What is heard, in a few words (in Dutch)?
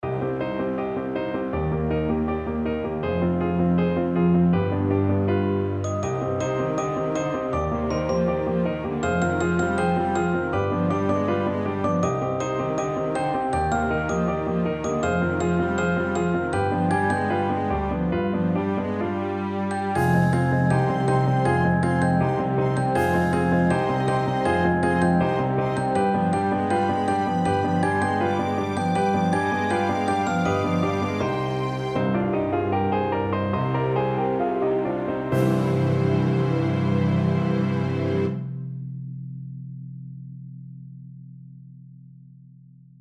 trap omhoog trap omlaag zonder zang